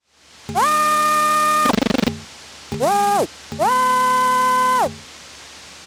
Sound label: jam reverse retry, printer; zt231